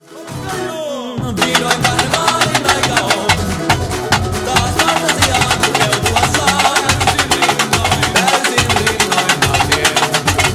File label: tamborim, bateria